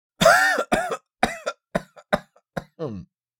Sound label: Male vocalization coughing